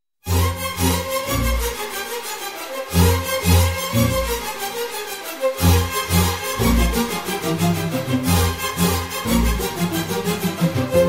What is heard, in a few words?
love feeling bgm love bgm ringtone sad love feeling; manmadhan; simbu